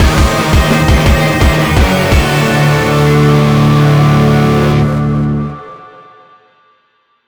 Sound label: STING Fail Mission